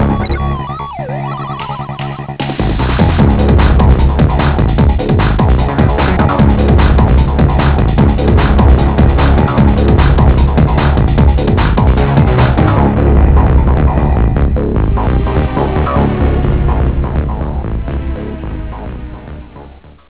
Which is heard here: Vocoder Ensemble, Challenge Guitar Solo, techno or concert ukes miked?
techno